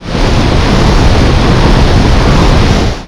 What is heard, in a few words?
tornado